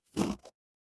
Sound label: cartoon chomp